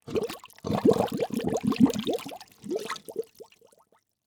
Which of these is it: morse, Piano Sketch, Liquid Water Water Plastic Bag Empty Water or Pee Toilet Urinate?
Liquid Water Water Plastic Bag Empty Water